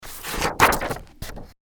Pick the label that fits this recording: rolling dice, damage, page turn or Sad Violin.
page turn